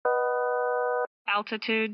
boeing 717 altitude alarm

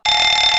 737 alarm

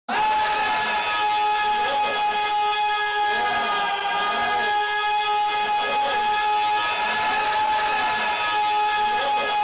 scream of pain; gachimuchi; thunders

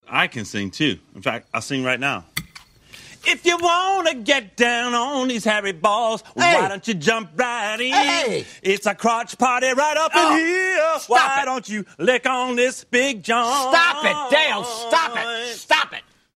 table song; step bros

